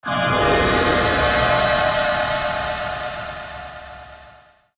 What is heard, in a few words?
Jingle Satan Room Appear